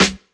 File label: Snare Drum